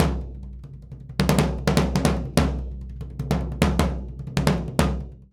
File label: 100 SURDO; Univers Sons; Basicussions